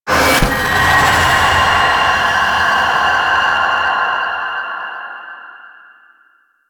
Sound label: Abyss Resource Pickup; Warframe; Abyss Of Dagath